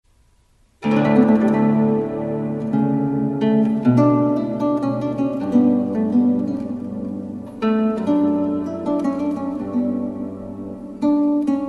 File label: oboe